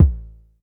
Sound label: Polite kicks long A1; LIMAT Div Dept; Drums